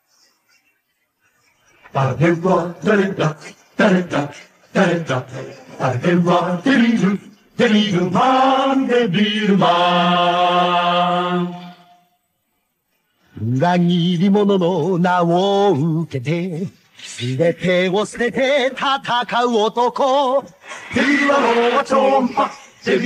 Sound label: Vocals; 恶魔人